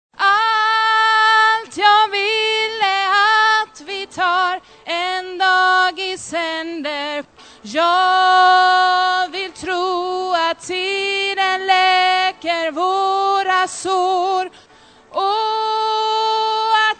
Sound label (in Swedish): alt, stammor